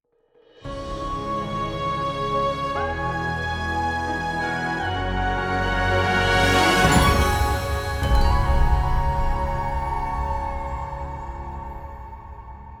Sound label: Stinger; inkwater Marsh Get Regenerate Ability